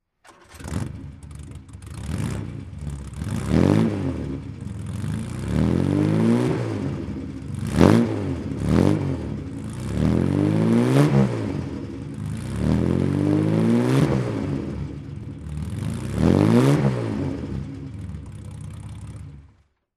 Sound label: aston martin db24 throttle